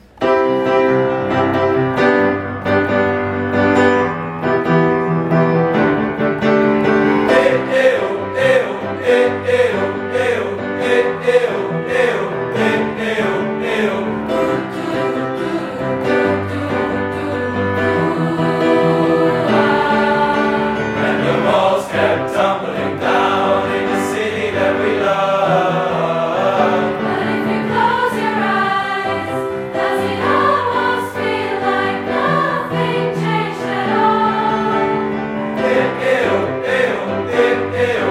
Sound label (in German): 4-stimmiger Kanon